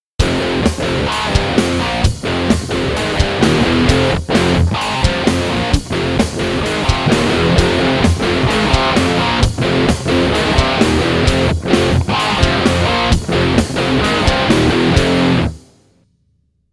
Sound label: Bugera 6260 Gain